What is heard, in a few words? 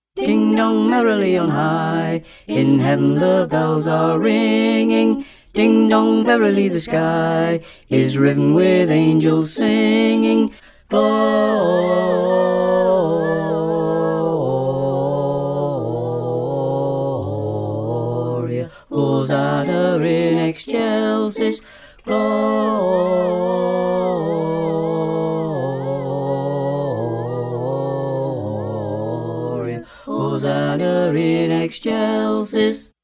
Ding Dong Merrily On High - Men, Choir Harmonies; Carols